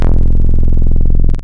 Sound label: One Shot BS Snappy, synth; Wavetables; Synth One Shots; Synthetic's Serum Collection; ESW Core Wavetables; Echo Sound Works Core Tables